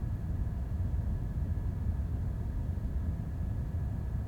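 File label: Ambience Servers-Fan Low Loop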